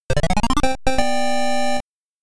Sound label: Power Up (PSG); Knightmare; MSX